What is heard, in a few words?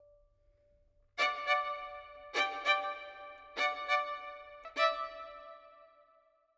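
Verb, Violin, 4-4, D minor, 100 bpm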